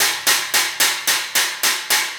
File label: Cymbal Pattern